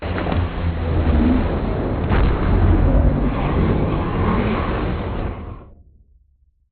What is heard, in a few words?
strafe close; Beacon; Titanfall 2